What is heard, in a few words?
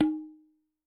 Tabla O, Yamaha DTXpress-II, Perc